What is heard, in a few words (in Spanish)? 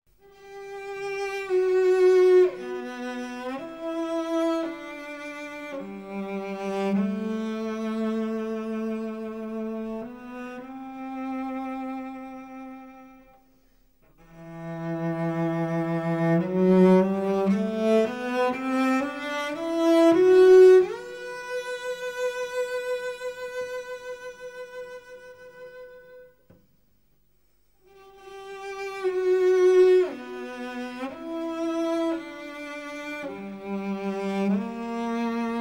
Violonchelo